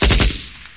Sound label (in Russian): korotkaya pulemetnaya ochered